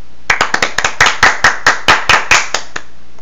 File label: clapping sound